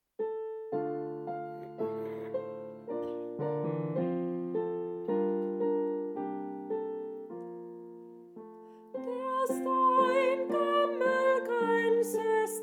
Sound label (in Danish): sopran; der staar en gammel graensesten